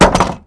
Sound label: wood; Mafie 1